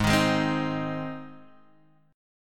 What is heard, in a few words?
Ab Major, Guitar, Open G; x,1,1,1,1,1; down